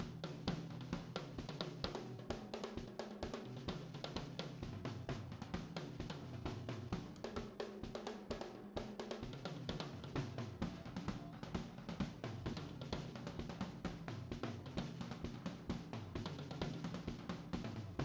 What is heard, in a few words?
Drums - Snare Coda